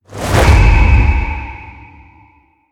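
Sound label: power activate; smite; thanatos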